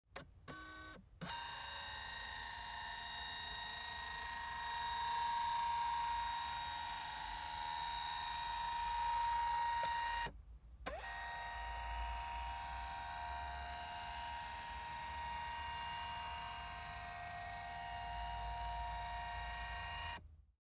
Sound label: scanner